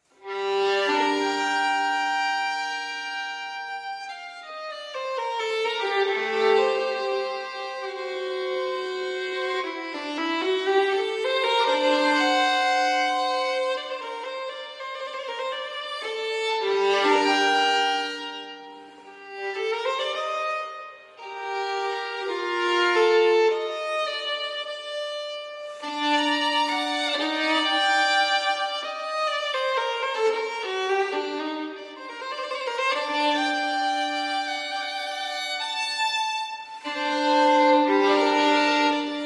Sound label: Violin Sonata, G Minor, Adagio; No. 1; BWV 1001 I.